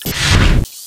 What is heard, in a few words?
pod open; ambient; source engine; hl2; citadel